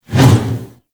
Mace Whoosh Slow; Warframe

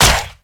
Step Default, Infested; Zealoid Prelate

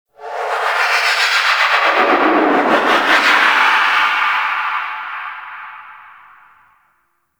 Duviri Undercroft Shriek Tonal Verb One Off; Warframe

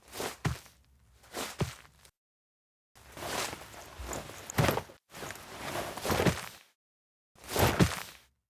Foley; Cinematics; Drifter Lotus Operator Awakes

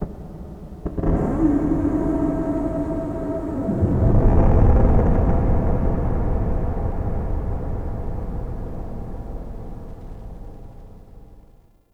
Dark Texture; Ambience; Warframe; Shadowgrapher

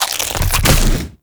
Infested Splitter Detach; Warframe; Grey Strain

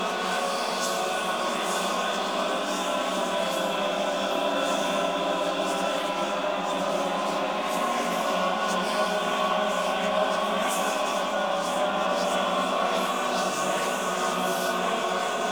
Choir Eyes Loop

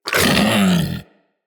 Vocal Pain, Hunting Critters; Characters; Predasite

